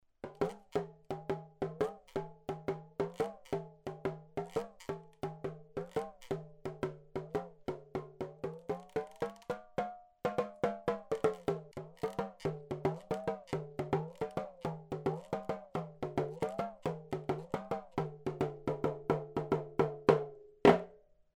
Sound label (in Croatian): bubanj